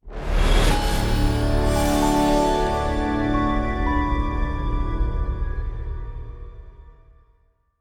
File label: startup Screen; netent